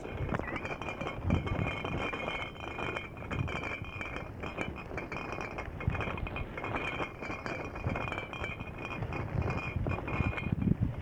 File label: beer